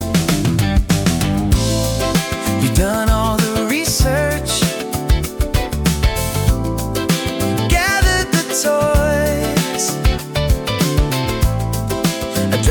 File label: Bored Again Indie Funk; Humain Records